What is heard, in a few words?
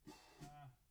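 BD Out, bass drum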